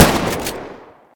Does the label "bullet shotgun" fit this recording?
Yes